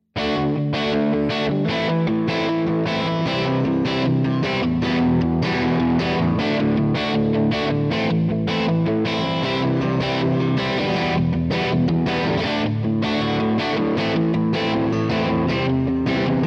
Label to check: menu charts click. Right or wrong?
Wrong